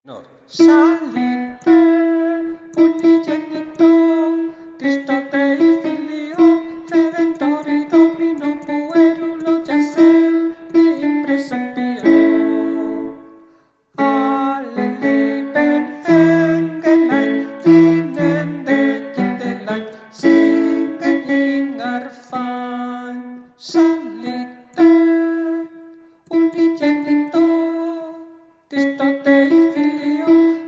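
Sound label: psallite tenor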